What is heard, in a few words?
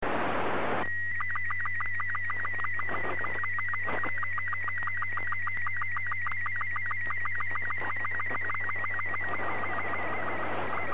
sstv; na1iss